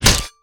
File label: Axe Shell, Damage